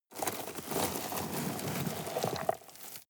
sweep small rocks soft

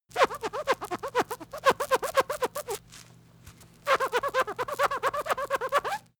sponge squeak fast

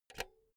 insert cinch

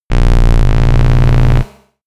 drone clicking bass rustler short

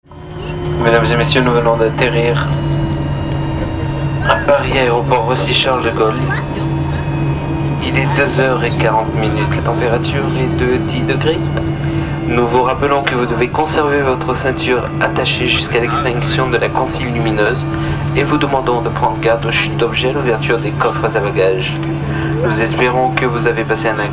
airplane french

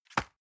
envelope drop medium

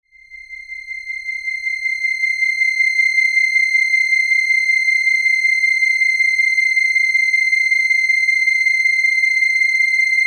high synthesizer tone

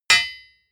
hammer hit steel bright

